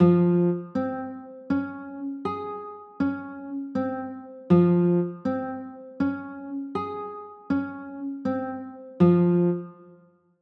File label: b flat m, chords; b flat m9